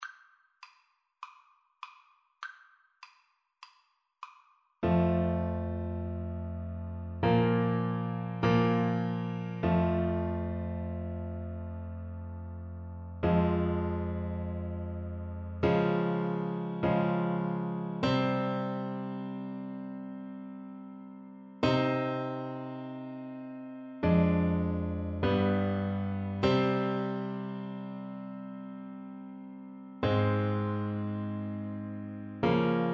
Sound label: oboe